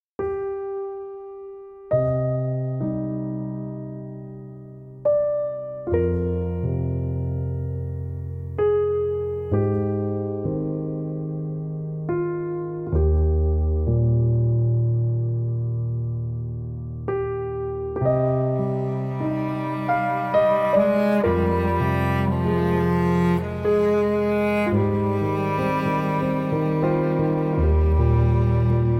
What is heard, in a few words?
Piano & Cello